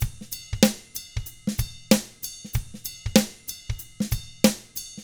95 funk, drums; ILIO; Double Platinum Drums 1

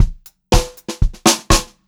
120 pop fill, Pop-Rock Drumloops; USB Soundscan vol.37; AKAI

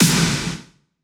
big snare; M8

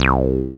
bass C, Acid Bass, Dance Synths

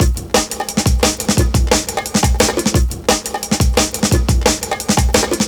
175, classic dnb breaks

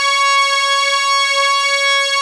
OMNI STRIN, ARP Synths; E-MU Formula 4000 Series Vol. 3; Analog Odyssey; ARP Synths II

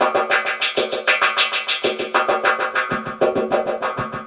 Echo Chuff Down Up, Synthetic Pulses

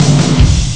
snare drum sample; Amiga Soundtracker Sample Packs

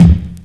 Snr; Mo' Phatt; Digital Sound Factory Mo' Phatt

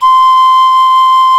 PAN H 5, panflute; SoundBytes - Early Patches

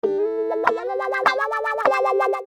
Wah Guitar

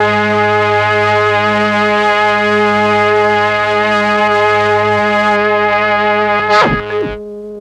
MEL STR, Legendary M400; ILIO; Propeller Island

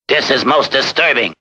disturbing, vocal

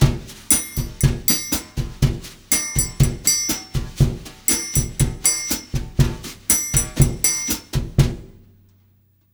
120 BRUSH, Brazil Bossa; USB Soundscan; AKAI

